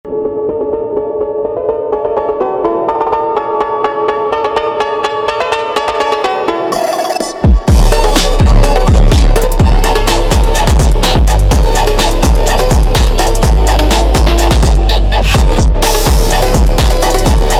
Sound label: Brazilian Phonk Bass Boosted Phonk Music